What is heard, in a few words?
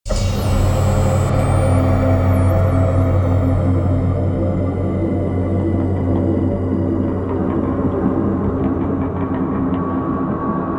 Death Stinger; Scraper First Strike